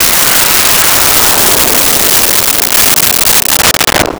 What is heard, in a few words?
Missile, Weapons; 1001 Sound Effects